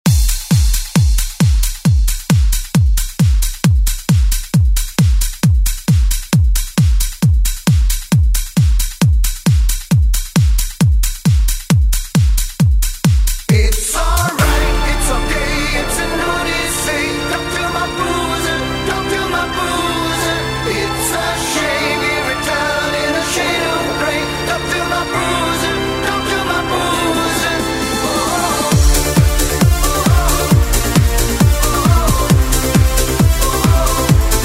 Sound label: 90s ReDrum; DJ Mhark